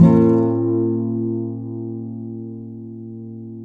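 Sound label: nylon guitar down minor B, flam chords; Spectrasonics; Hans Zimmer Guitars VOL-2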